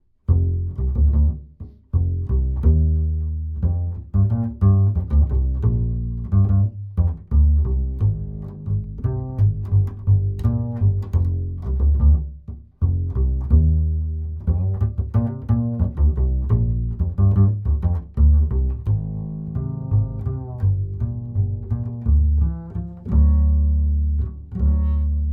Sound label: bass; TUBE